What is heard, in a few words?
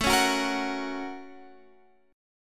F sharp Major; F#/A strummed; JGuitar